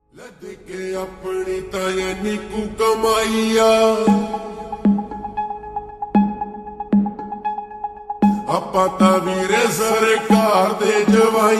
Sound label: Slowed Reverb Ringtone